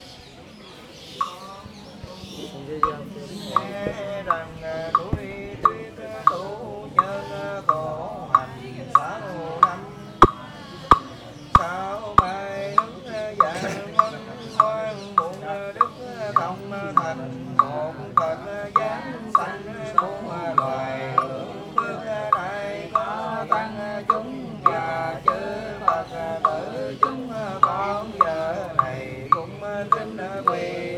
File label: saturated frog, india